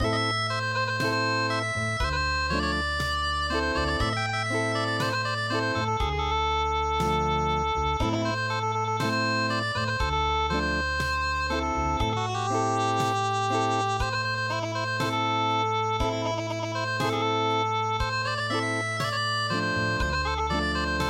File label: Acoustic